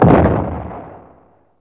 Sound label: explosion sfx; drumkits; pi'erre explosion sfx; fl studio